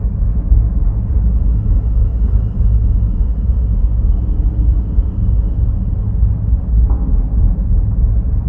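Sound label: ambience crawl space; Duke Nukem Forever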